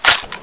bolt release, weapons; gewehr; nzp

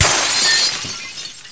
glass break; weapons; tesla

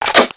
top close, weapons browning; nzp

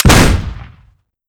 dbsg, shotgun; weapons; nzp

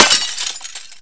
glass, breakable; q3rally

